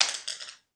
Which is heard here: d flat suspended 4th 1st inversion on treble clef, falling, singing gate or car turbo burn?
falling